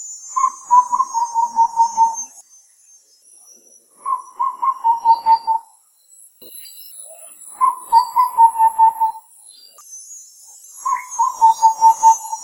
Chestnut winged Babbler calls, Sabah Borneo; RDC Sepilok Sandakan

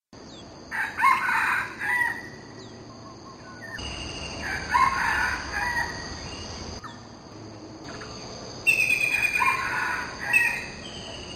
Red Jungle Fowl male calls

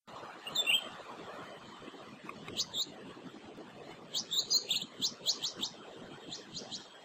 Blue winged Leafbird female calls; Amar

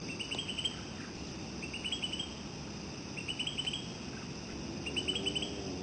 Lesser Green Leafbird Male call; Amar

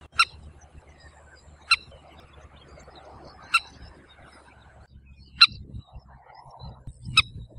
Common Moorhen calls; Amar

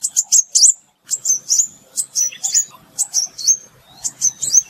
Crimson Sunbird calls

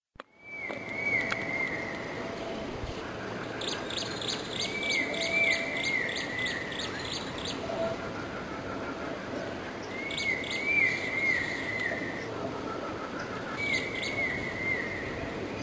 Grey Headed Fish Eagle calls; Amar

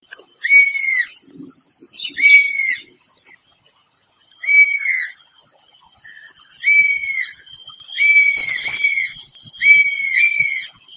Black and Yellow Broadbill calls; Amar

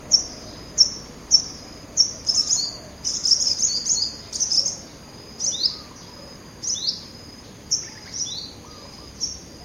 Olive backed Sunbird calls; Ipoh Perak Malaysia

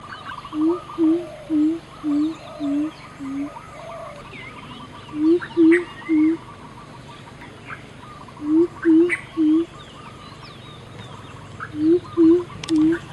Greater Coucal calls; Amar

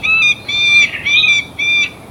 Peregrine Falcon calls, Ipoh Perak Malaysia

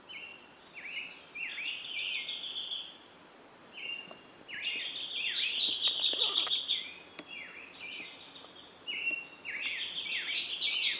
Silver-Eared Mesia calls

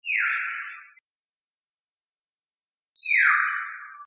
oriole call

